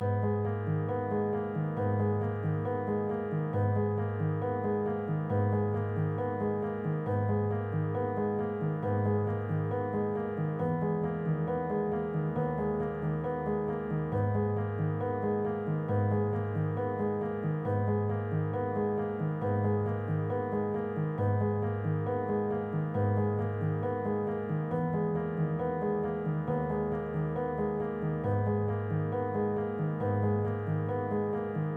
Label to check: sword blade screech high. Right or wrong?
Wrong